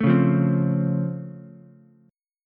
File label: Db Augmented Major 7th, down, Ukulele; strummed; JGuitar